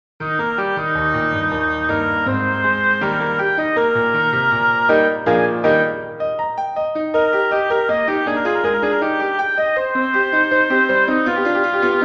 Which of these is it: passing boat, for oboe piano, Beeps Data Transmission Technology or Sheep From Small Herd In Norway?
for oboe piano